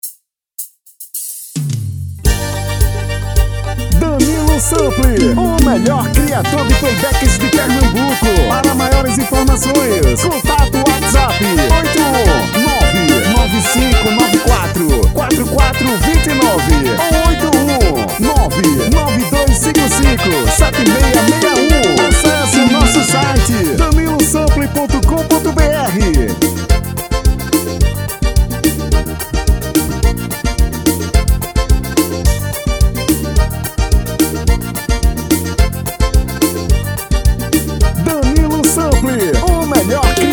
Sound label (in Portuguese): Forro das Antigas